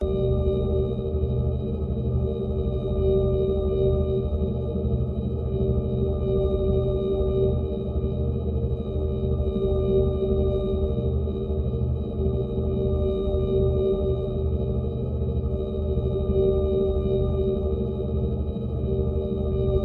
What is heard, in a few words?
space drone; Audio Mangler